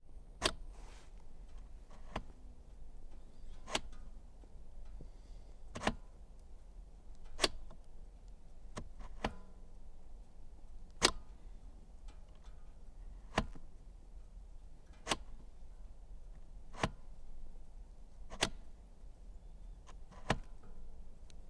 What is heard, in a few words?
suzuki 750axi var throttle; Sonniss GDC